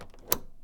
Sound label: Key lock; Phasmophobia